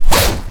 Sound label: skin pull